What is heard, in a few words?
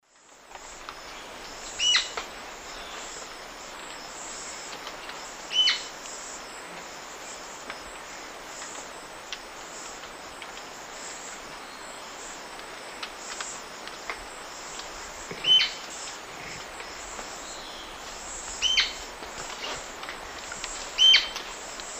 Onychorhynchus coronatus, calls; S. America; Eastern Lowlands